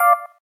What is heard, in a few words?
doremi 9